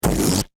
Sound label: Foley Balloon Expel Air Fast; Balloons; Cartoons; SFX Bible 6